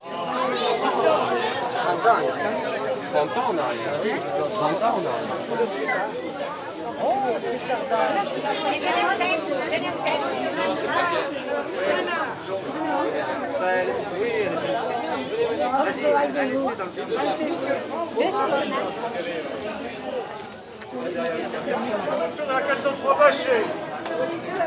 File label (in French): Amb Marche Dans Village; French